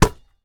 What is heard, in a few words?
Street Ball Slap Ball Block; SFX Kit; Street Basketball